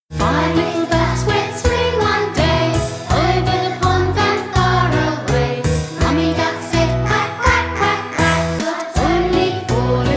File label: five little ducks; differentiated listening games based on familiar nursery rhymes